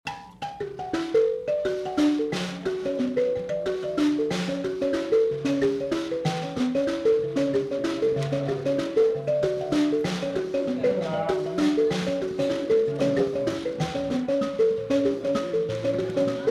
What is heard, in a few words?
mbira